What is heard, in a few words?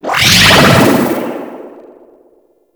Hyena Electrical Aura, Enemies; Warframe